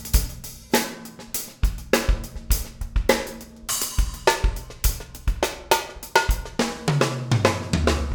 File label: drums; softube tsar-1